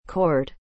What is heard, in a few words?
chord